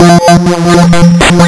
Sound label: lsdj waves; wavetables